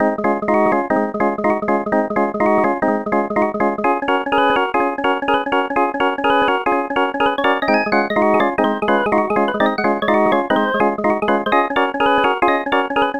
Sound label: Home Organ Blues